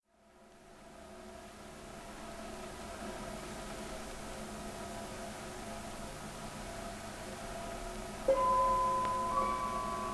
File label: victory for orch